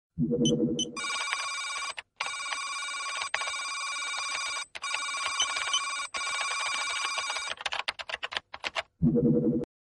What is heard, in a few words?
Key Board Tones